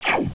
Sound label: visor ray